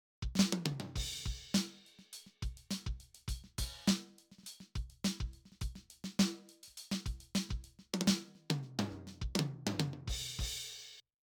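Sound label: Bubinga Africa, drums; Simmons SD1200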